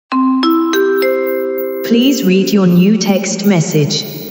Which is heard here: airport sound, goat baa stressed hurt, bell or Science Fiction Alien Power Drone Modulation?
airport sound